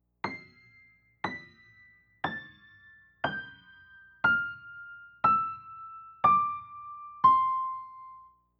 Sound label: piano